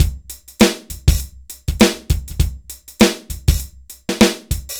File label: dry loop, BPM100